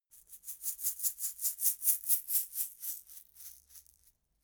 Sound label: shaker plastic egg rattle dynamic movement